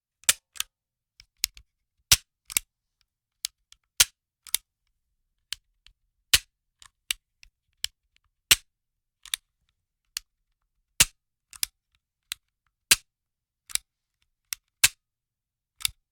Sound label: gun shot dry fire